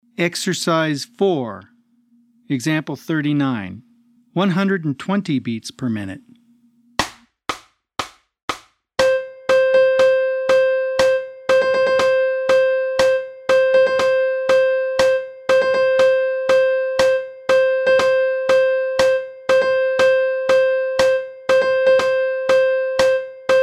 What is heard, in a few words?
120 BPM